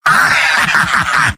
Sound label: laugh short; demoman; video games; source engine; tf; mvm